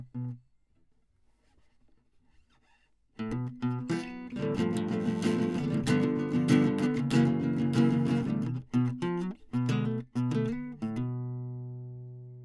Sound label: Classic Guitar